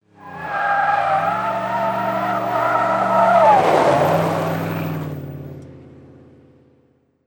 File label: jeep arrive and brake